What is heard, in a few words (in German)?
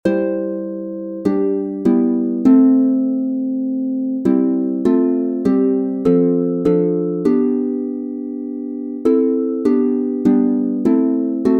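C Dur 3 stimmig instrumental, Harfe; Lieder Kirchenjahr; Jerusalem du hochgebaute Stadt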